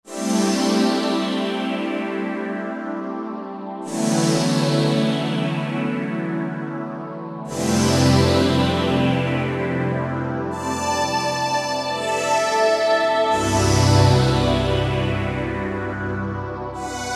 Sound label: 5th Sweep; Roland JV-2080